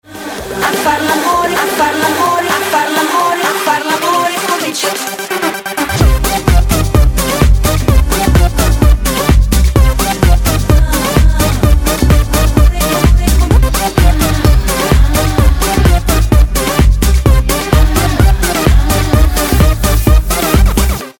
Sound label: BEAT